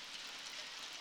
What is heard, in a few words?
Boat Noise